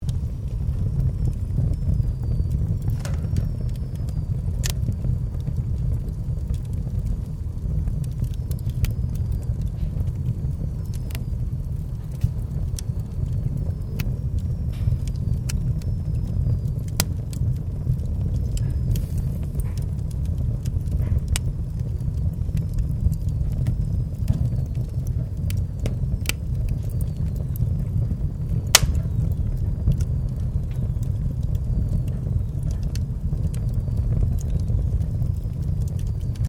Fire Woodfire Domestic Fireplace, Small fireplace fire; Fireplaces (Sound Effects:Household)